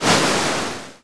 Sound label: Ocean Spray; Civ4 warlords